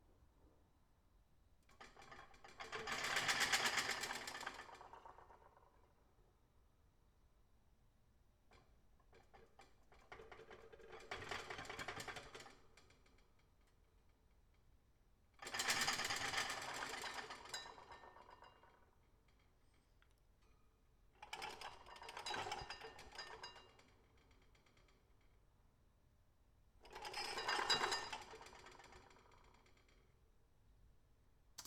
Dishes Rattle

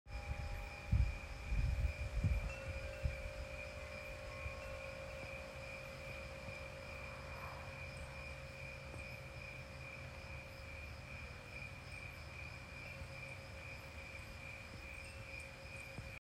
Night Wind Chimes

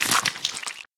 Blood

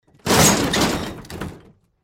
Car Impact Small Metal Impact With Flip

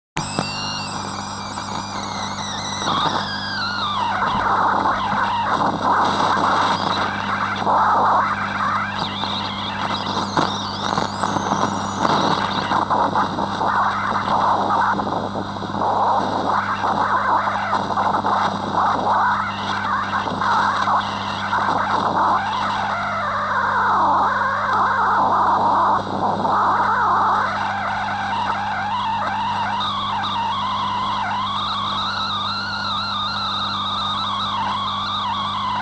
More Of Defective Analogue Television Set

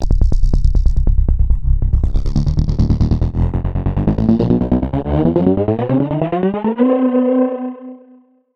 Pitch Rising

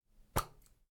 Open Cap

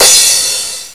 Crash

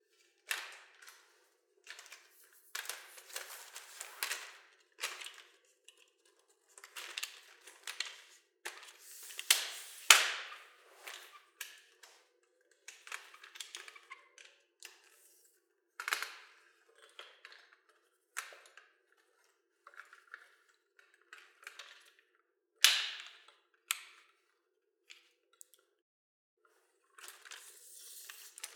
Tripod Setup And Breakdown

